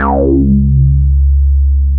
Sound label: C#3, Bass; E-MU Producer Series Vol. 6 – Analogia Project (CD 1); Boom da Bass 2